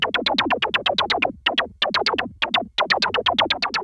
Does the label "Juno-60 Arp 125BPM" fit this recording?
Yes